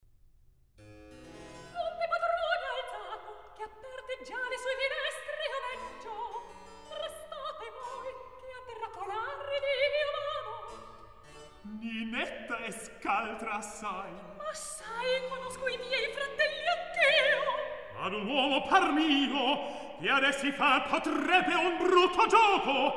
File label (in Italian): Recitativo; L'un de' patroni e alzato; Mozart; La Finta Semplice